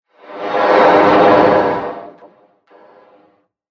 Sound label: Science Fiction Spaceship; Spacecraft Sound Effects